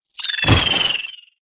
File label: battle Beak die